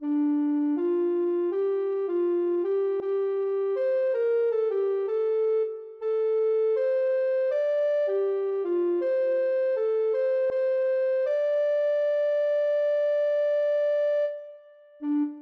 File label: ocarina